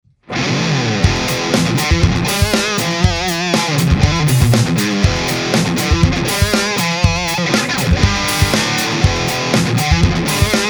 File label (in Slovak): mikrofon c.3 poloha c.1, Test mikrofonov na git. aparat